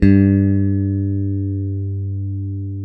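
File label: CLN STRAT, Synclavier, GUITARS; Sampler Library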